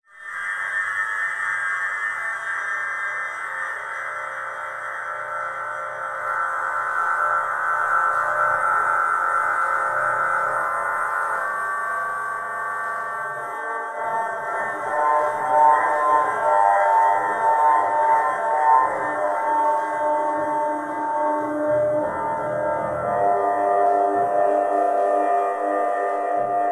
Title Transition Stab Dark Long; Production Element; AdobeCC

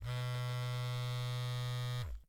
Electronic Cell Phone Vibrate Face Down On Cement

